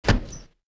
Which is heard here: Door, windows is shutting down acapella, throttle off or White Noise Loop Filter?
Door